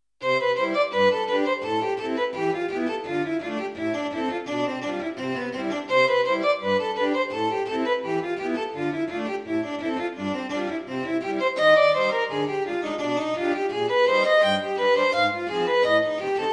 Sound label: gavotte